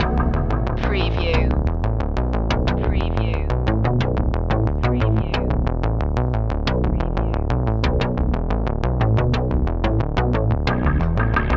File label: loop